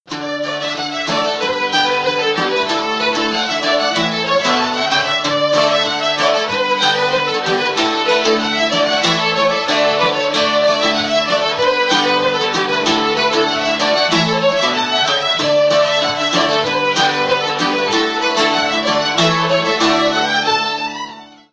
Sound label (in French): Detail rythmique